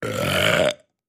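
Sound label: Nice Med Large Airy Be, belching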